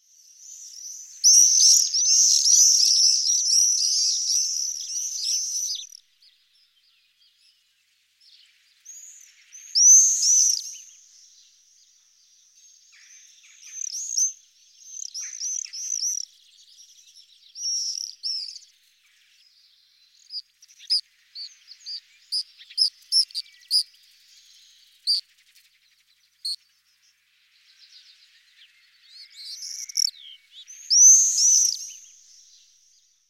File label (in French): Martinet noir